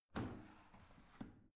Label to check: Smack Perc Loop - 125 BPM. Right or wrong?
Wrong